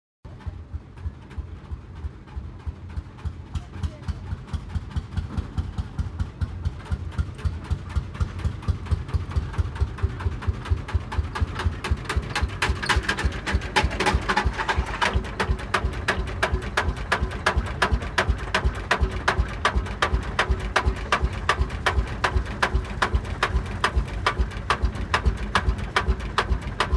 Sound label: tractor driving